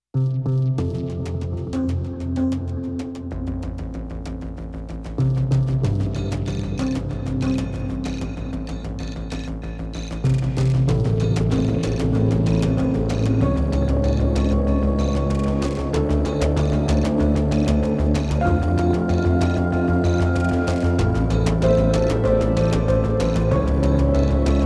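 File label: Voices, Keys, Rhythmic Textures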